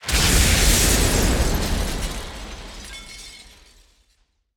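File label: explosion material building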